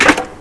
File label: rpg reload, weapons